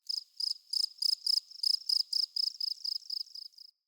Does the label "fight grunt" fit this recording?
No